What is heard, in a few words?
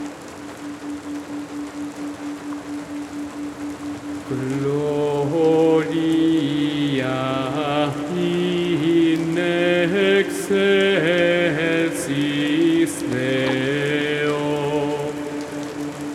night rain with gregorian chants for deep sleep 528hz loopable